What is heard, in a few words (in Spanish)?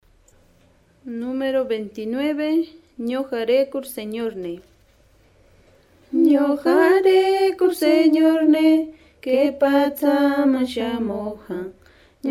cancion